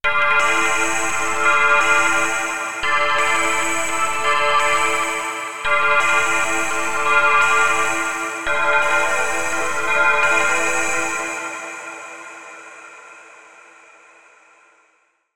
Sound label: Signals With Bells; GForce ImpOSCar3